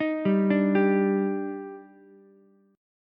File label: Eb Major 7th, Ukulele; EbM7; arpeggiated; JGuitar